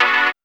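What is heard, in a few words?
synth chord; E-Lab; X Static Goldmine 1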